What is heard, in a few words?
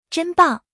cheer